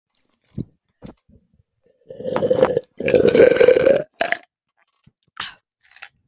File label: mysterious food sounds